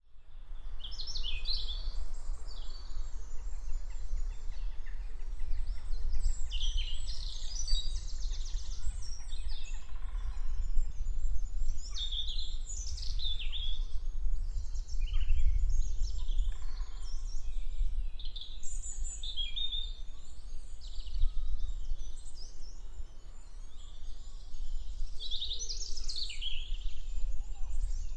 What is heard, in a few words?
dartington birdsong in spring